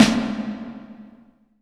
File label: IMP SNR DRUM, Drum, Cinematic Impact; M-Audio Pro Sessions VOL-25; Liquid Cinema